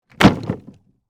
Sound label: Impact Wood Plywood, Drop, On, Plywood; Materials